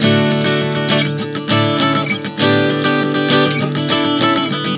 D-A-G-A 100 bpm, acoustic guitar chord progressions